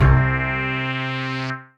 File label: Bass, P-50 Linear; Tektonik; Retro Synths; Ableton; Factory Packs; P-50 Structures